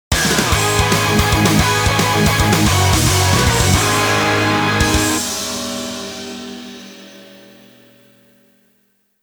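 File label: Jingle Type Unknown A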